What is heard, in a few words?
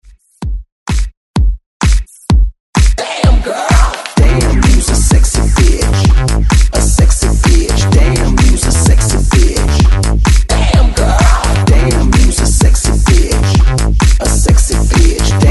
Hype Breaks 128 Bpm